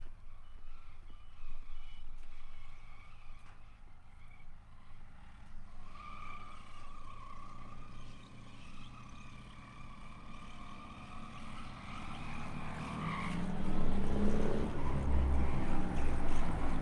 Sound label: tank driving and stopping revving close to far stereo; Sonniss GDC